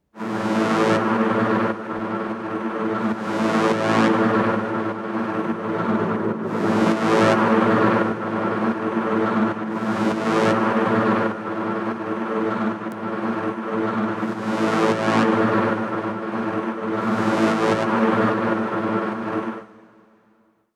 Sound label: Boss Fight; prince of persia the lost crown gamerip 2024